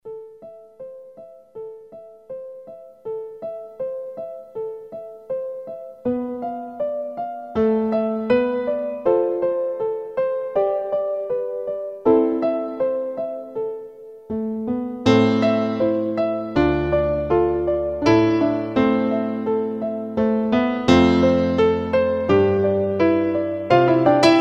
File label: piano; MSX